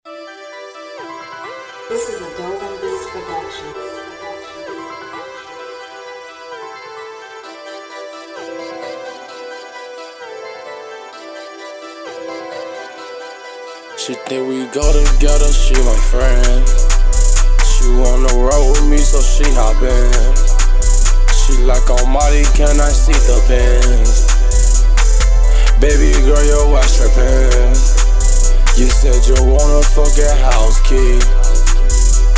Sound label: Rock N Roll